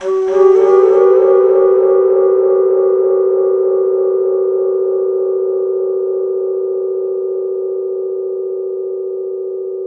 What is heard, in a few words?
Flute Effect, effected flute